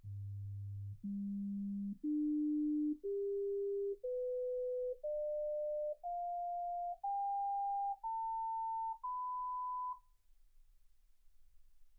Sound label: sinus tones 48kHz